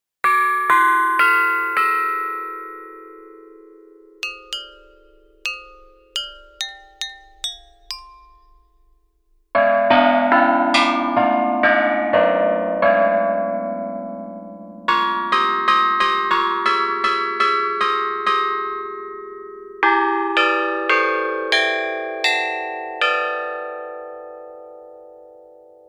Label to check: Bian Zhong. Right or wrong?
Right